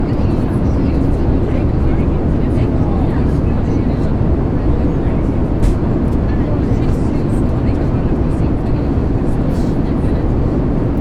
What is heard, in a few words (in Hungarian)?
repülés közben